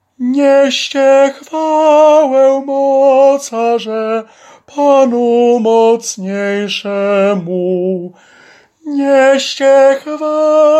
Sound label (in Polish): TENOR; Niescie chwale